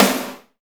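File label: GRITTY SN, snare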